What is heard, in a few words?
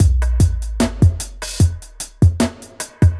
hiphop